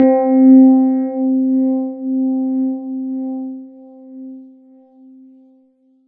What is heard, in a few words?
Korg TR Rack Old Dark E P C4